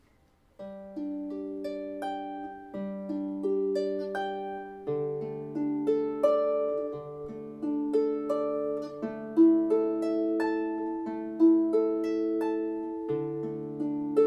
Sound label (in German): Harfe; DieTrauerndeHarfe; kurz